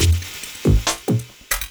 LOOP, 140 BPM, Drum & Bass

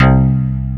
DX SYN BASS

